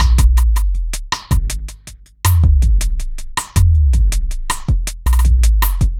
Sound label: Groove, Tribal Fusion